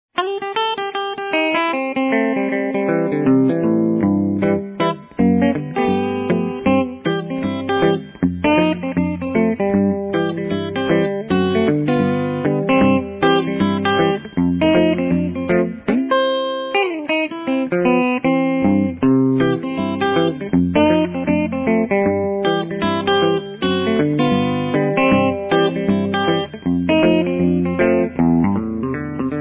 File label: shuffle